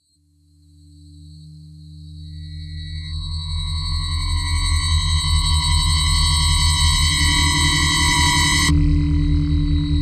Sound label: Interference Field Transmission